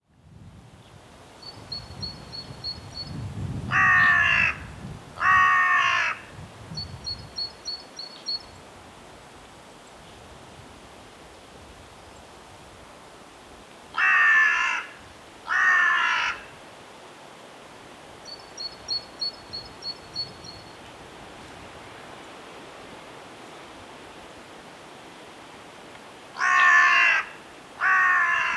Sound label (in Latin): Corvus corone; SETE